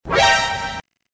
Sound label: alert